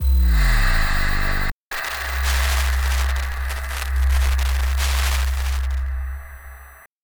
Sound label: bgm